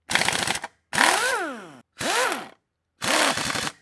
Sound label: repair sound; items